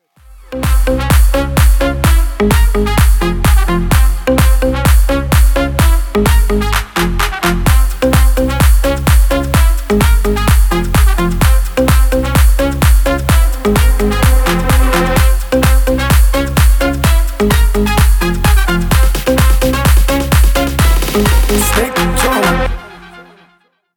snake charmer instrumental

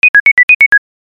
classic ringtone

